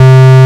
MONO POLY

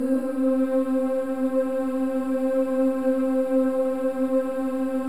BOYS OOS; Spectrasonics; Symphony of Voices

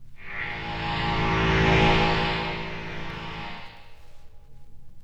Prehistoric Synth A1; radiosonics

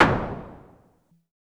Drum X Clap; Pearl Drum-X

